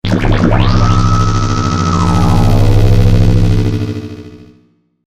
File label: Ufo, Bean, Ray, Vintage, Science Fiction; 5.1